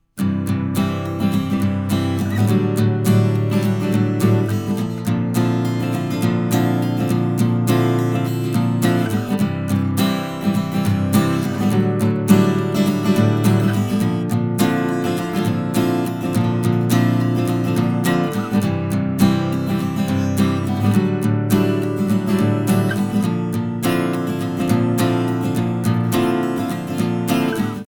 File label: studio projects B1